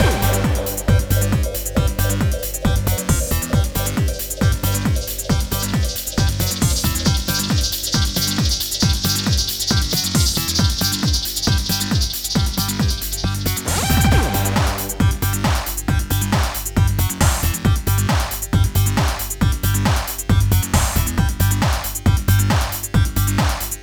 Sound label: Battle